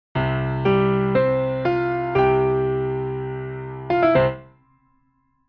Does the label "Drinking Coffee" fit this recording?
No